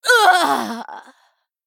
Female High Elf Death

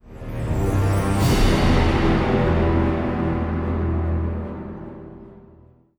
Stinger Neutral

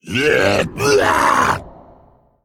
Male Construct, Attack

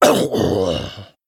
Male Gnoll Death

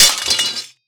Death Underlay

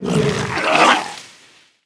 Corpse Flower Attack; Sherazin Corpse Flower

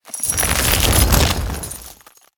Ice Elemental Attack

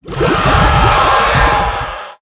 Hydraladon Secondary Death

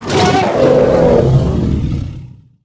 Devilsaur Death; Loti The Devilsaur

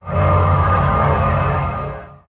Attack Underlay

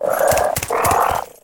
Frog Mount Attack Underlay

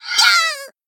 Female Elemental Death

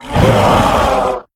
Ironhide Direhorn Death

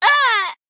Male Gnome Death

